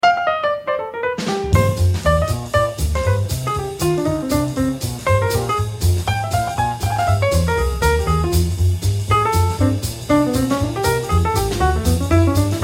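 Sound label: piano solo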